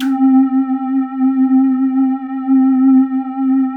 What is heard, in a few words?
LEADS; Masterbits; Vintage Voltage